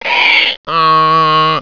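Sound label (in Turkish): katır, hayvanlar; efekt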